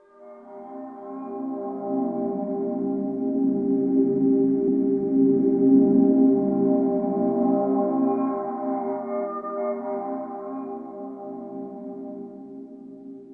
waterphone c5; PC Gamer IT CD 40; SMACDEMO

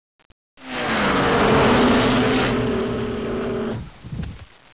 MOTOR; Talking E-Mail

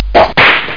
Whip; FastGun3

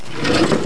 open; lotus

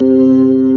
Mid Slow F#2, B3; SoundFont - The Developer's Choice - Volume 1

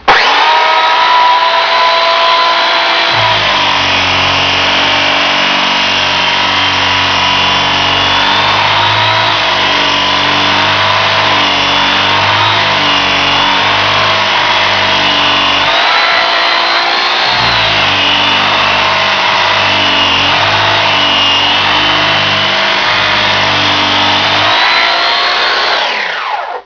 electric motor, sound effects, electronic; pinnacle; english

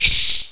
snake; mordor

